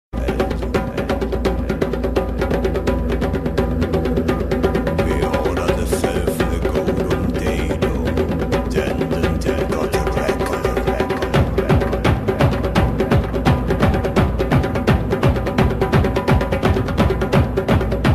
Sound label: african drums